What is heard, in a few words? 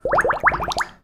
sound triple; solvable-sheep-game